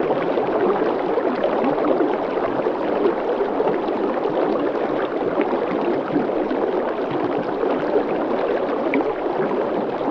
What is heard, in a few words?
Stream Fst Gentle Bab, streams